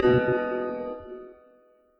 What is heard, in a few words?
piano B2